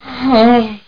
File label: pant; Battle of the Superstars